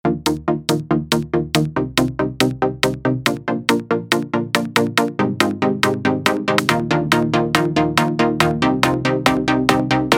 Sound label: techno; kick factory techno expansion